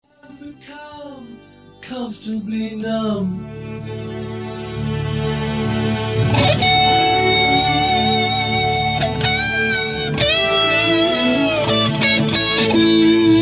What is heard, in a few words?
first solo dry